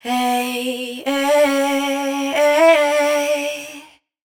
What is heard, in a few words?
riff Hey Ay Ay 90 Bm wet, vocal; Slate Digital Omnivox Vocal Sample Pack